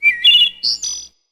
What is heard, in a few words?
Forest Open Glade Bird Close; Day